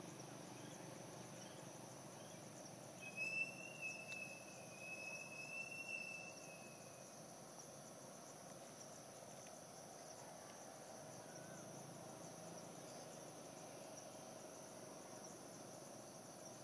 background sound from drilling; Alas Tuwo

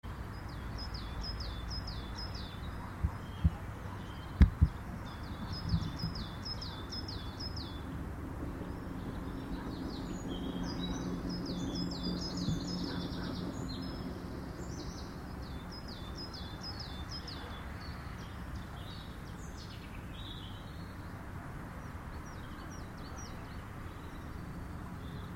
garden birdsong at lunchtime

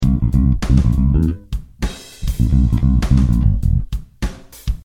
16th note drum groove

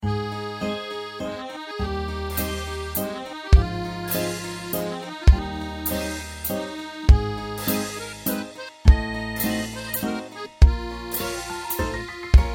3:4 Waltz

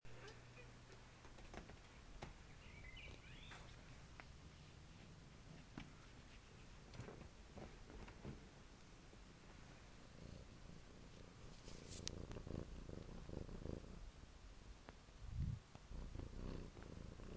purring

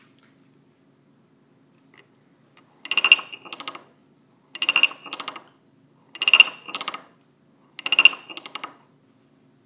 a very cool instrument

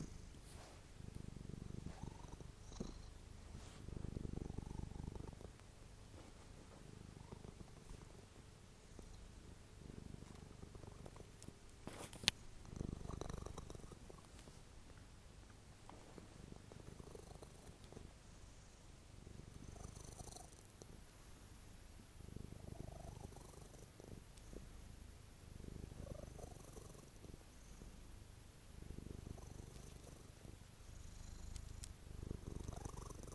the melodious purr of our little lady cat; about half way in she picks up

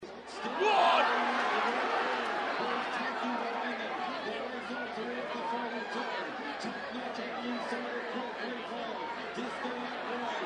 the sound of the crowd; yorkhill

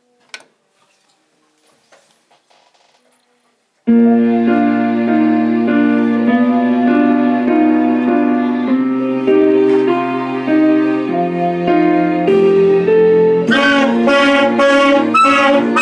saxes

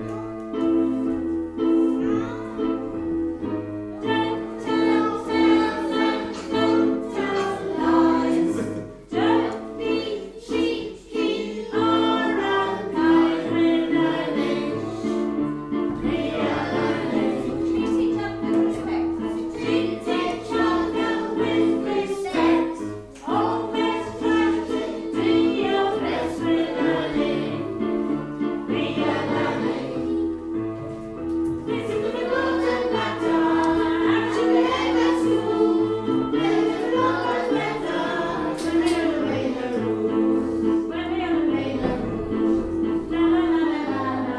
singing in yellow class

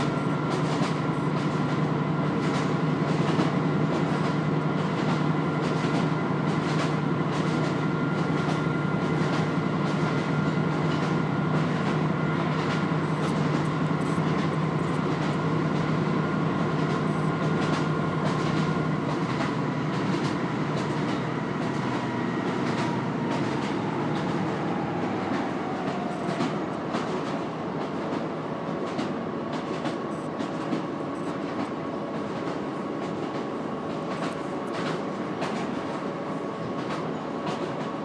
clickerty clack

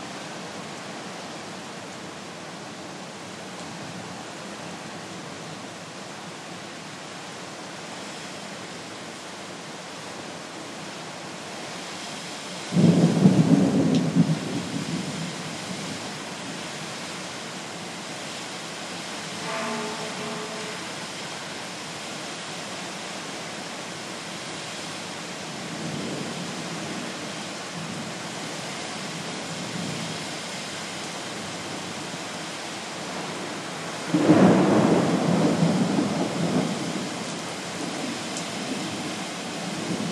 Bangkok thunderstorm

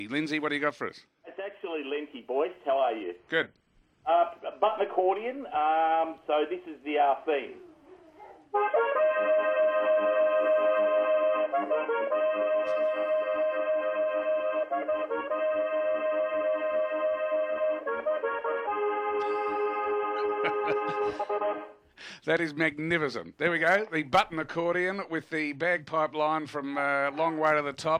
bagpipes on a button accordion

